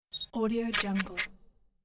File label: camera shutter click; sfx-for-you